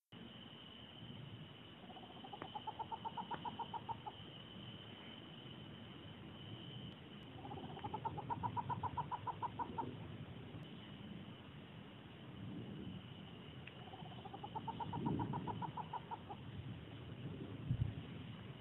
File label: owl pacific screech; costa rica